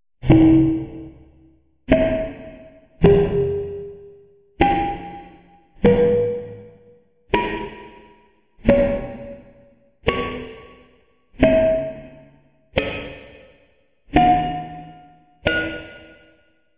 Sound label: Tin Can Ping Scale With Reverb